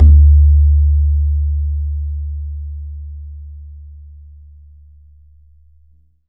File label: BAYAN, Drums Percussion; E-MU Producer Series Vol. 4 – Denny Jaeger Private